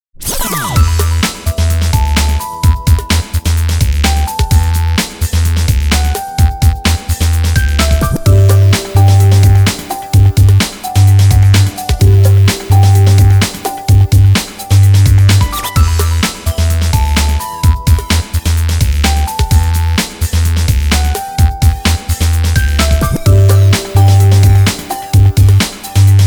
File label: breakbeat